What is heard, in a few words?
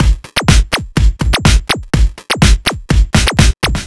Drums, 124bpm, Nu Dance Electro; Zero-G; Soundclash; Combi Loops; Filthy Fury